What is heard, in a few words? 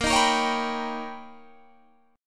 B Augmented Major 9th A sharp, chord